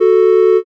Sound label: horn